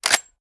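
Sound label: foley raise first break; gmod; viper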